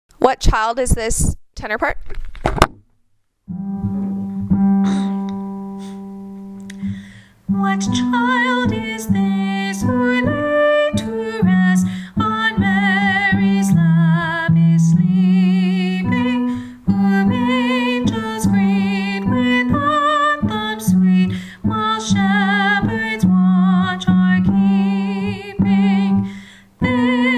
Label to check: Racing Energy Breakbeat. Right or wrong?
Wrong